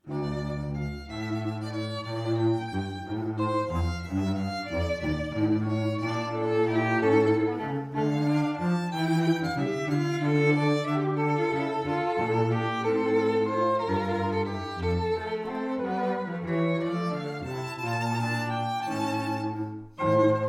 trio; pezel

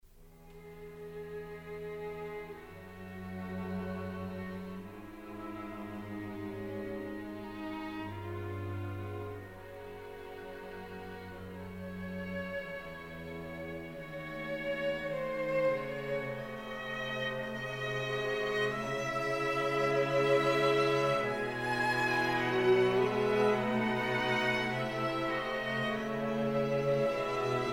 quintet

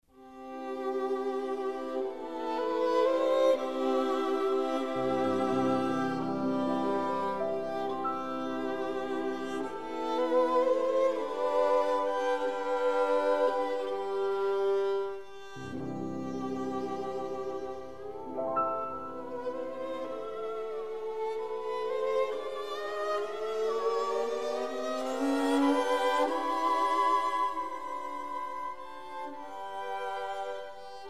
serenade; sinding

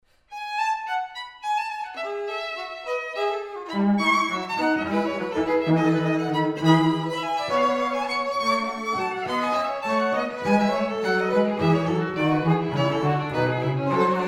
trio

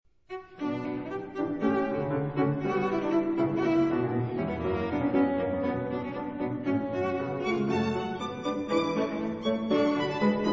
trio in B; loeillet

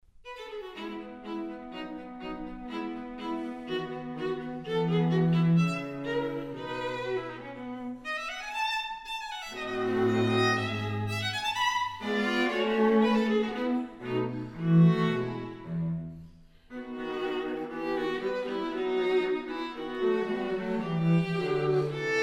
trio

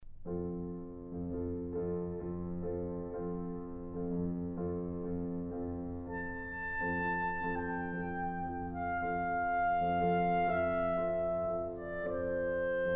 trio; glinka

